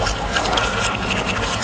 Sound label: servo, turret floor; hl2